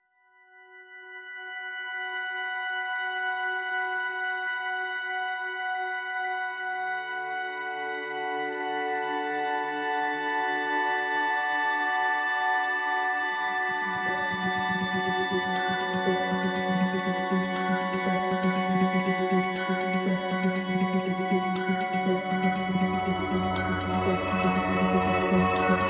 Ambient

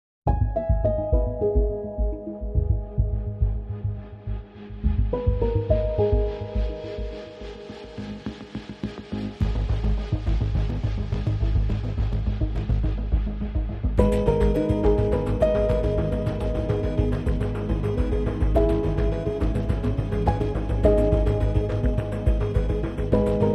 No Perc

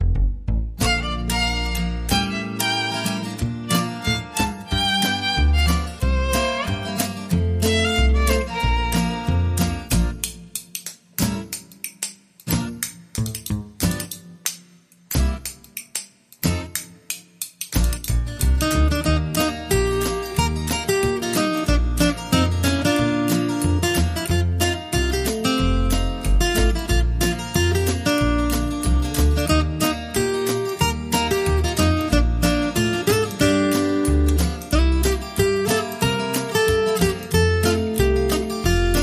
Steamboat Two Step

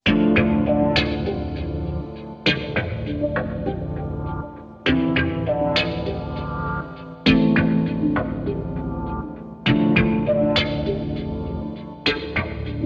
Beatless

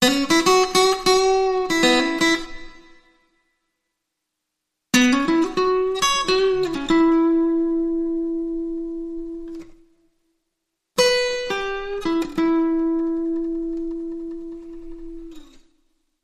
Blues Licks

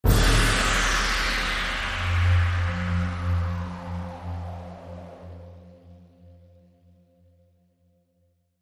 Impact Sting & Drone

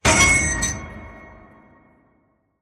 Lightning Flash

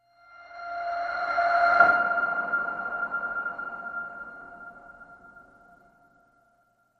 Piano Ping Rev F

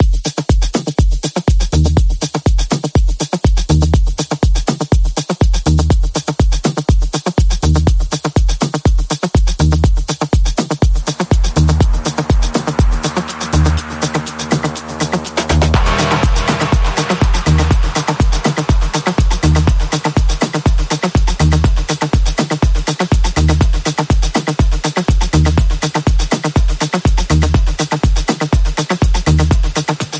Rippling Beats Rhythm

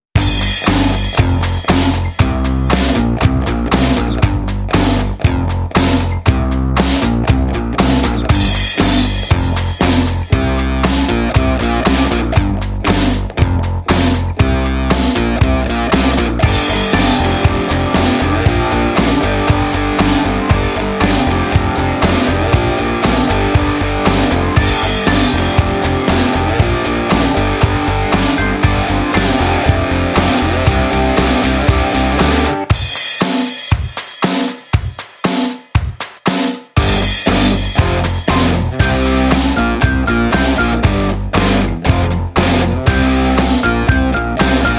Rock Out Loud

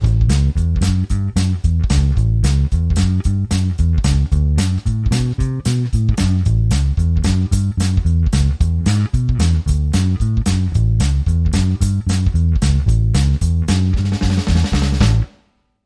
Bass Walk

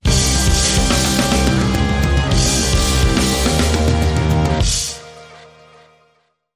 Link Sting

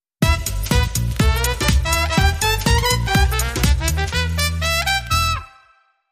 Aahhs And Brass

